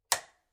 照明のスイッチ音

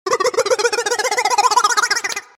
ケロケロのおもちゃ

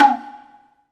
歌舞伎の堤太鼓の音

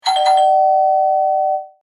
玄関のチャイム

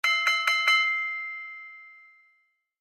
リングサイドのゴング

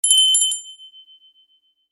お坊さんが鳴らす鈴の音

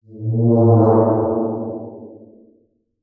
臭い音

